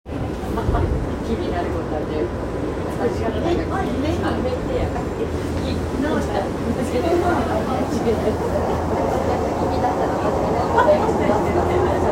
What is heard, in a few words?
train sounds; 東急東横線武蔵小杉南